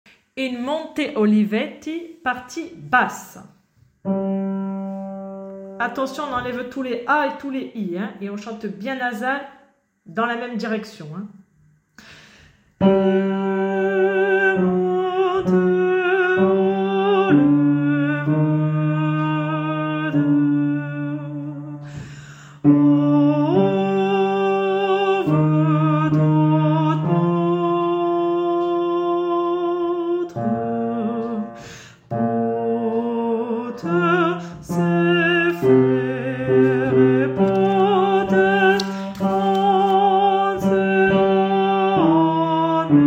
basse; In monte olivetti

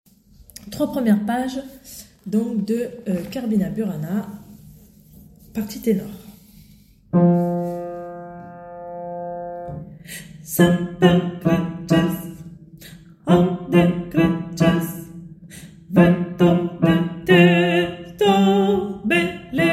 tenors; Oh fortuna 3 premieres pages

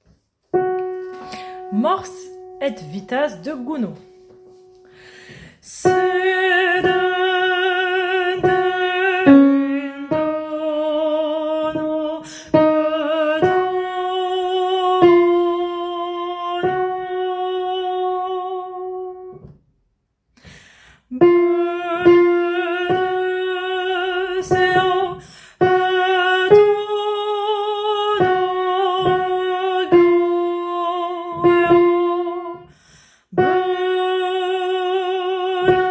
partie alto; Mors et vita

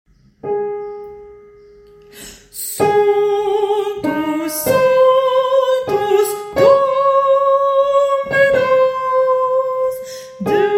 Alto

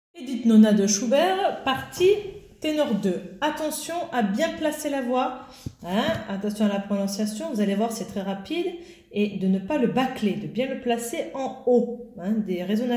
partie ténor au tempo

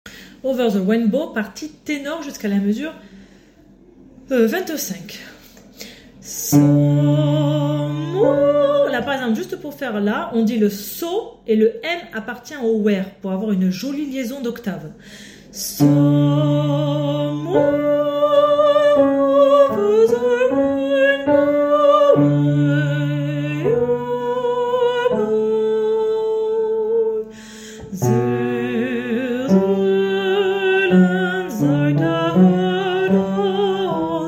Tenor

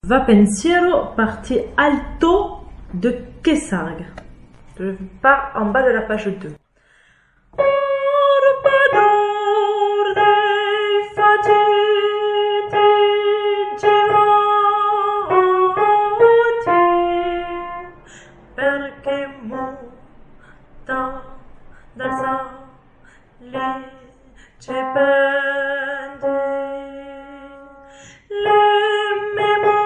Alto triolet; Va Pensiero; Verdi

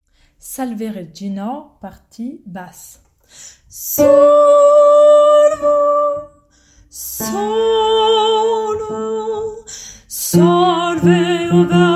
partie basse; Salve regina

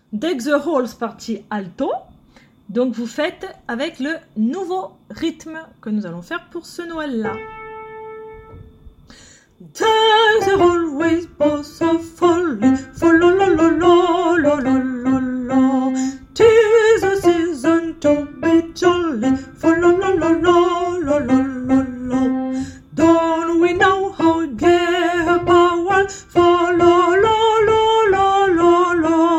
alto nouveau tempo

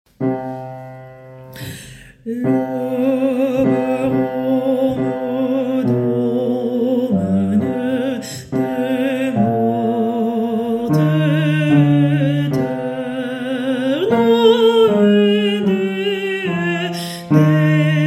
Basse

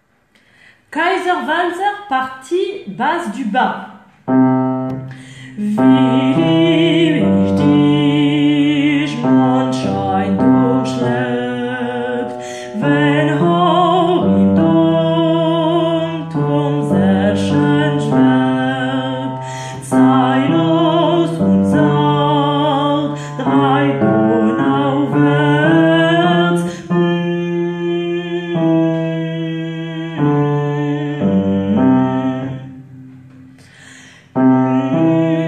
valse basse; KW Strauss 1ère

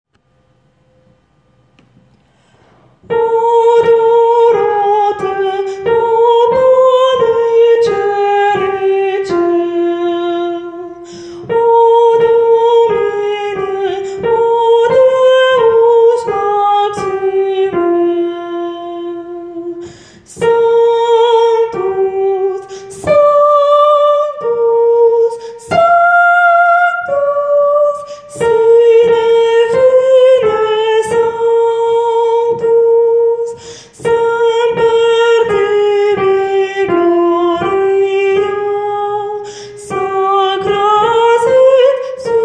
Ténor; Adoro te

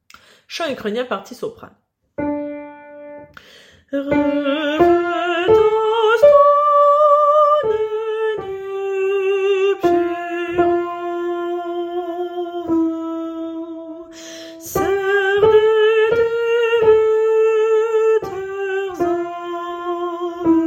Chant Ukrainien Soprano

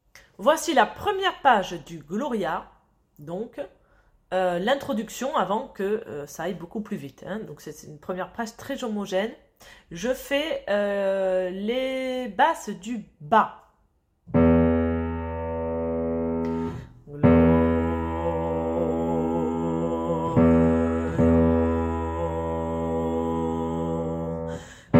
basse bas; Gloria intro

